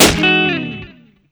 Pig Balloon Pop; angry birds rio

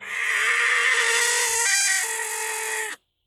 goat burn

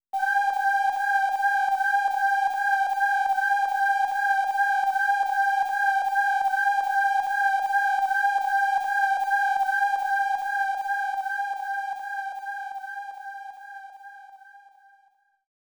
ROUND BGM; Taito Digital Sound Archives Arcade Vol.1

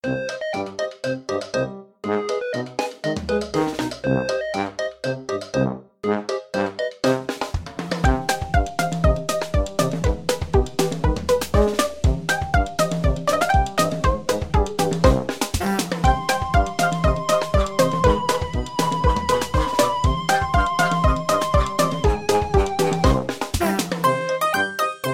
Ingame Music; Bucketz

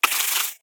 Spider Pain; The Inn

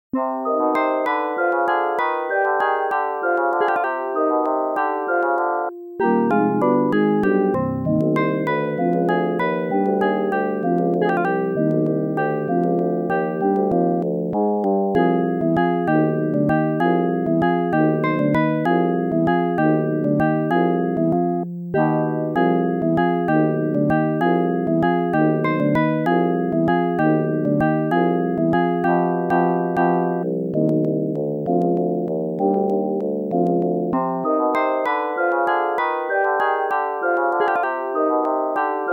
(Field)

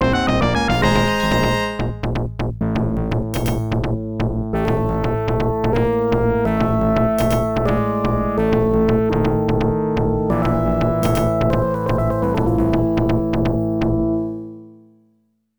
You Failed! 2 (Bonus Stage Failed 2, Player Miss); Shadow Dancer; arcade

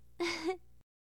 Massage; SFX Bathroom; Hilzartov; Fairy Size Doesn't Matter

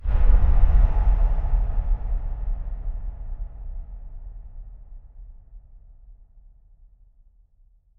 enemy assassination stinger

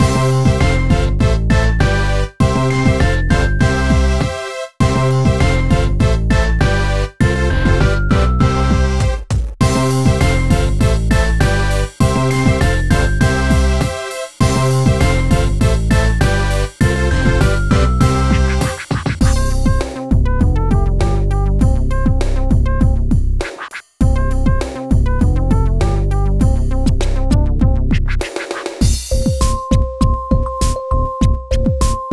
Music BPM120; super scribblenauts; nds

